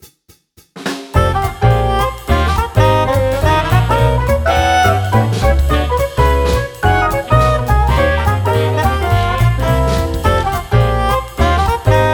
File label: multitonic 4 tonics